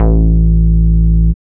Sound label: bass; E-Lab; X Static Goldmine 1